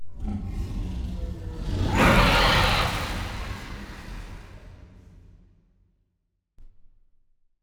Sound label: aquatics dragon death